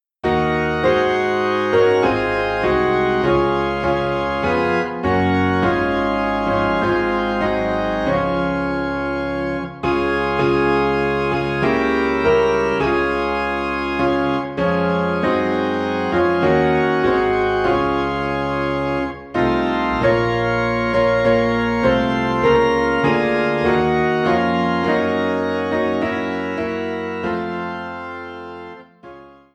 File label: Piano and Organ in D Flat